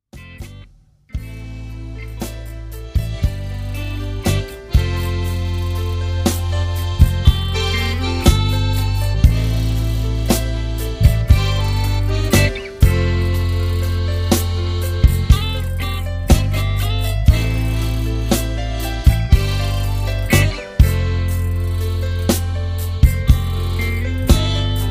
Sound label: zydeco slow song